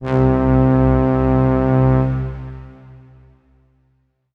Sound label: Yamaha SY35 Brass With Strings C4